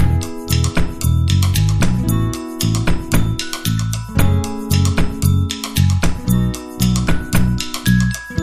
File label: Happy Face (High)